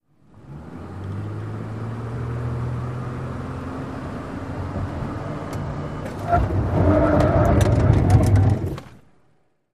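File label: SUV Truck 180 Skid Mix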